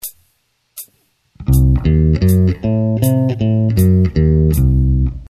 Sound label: c dim7, bass